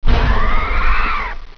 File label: Mine Die; Virus: The Game